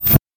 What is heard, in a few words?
household television switch off; sound effects one